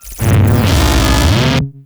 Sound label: Attack; Warframe; Corpus; Jackal Redux